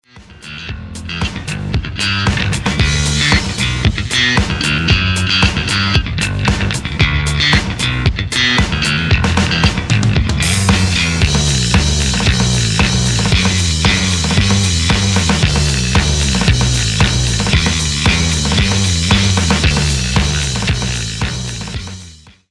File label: bass